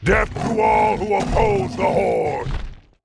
Wyvern rider warcry

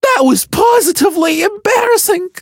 hurt voice; finx; 芬克斯; 神话

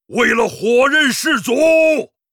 Hero blademaster warcry, zh; 剑圣（魔兽争霸3）

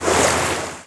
Dolph mov Loop; Dolphin

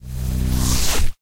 Spell Deactivated

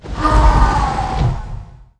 female demon hunter death alternate

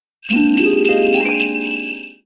Reindeer Pig Running; Emote

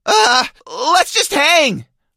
hurt vo; doug

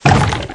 big skeleton walk

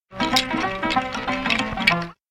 Emote Dancing Skeleton

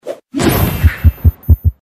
Emote Firecracker Heart; 烟花炮手

